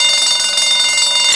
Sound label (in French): sonnerie; dutch; space